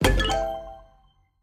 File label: explode panther multi; serengeti kings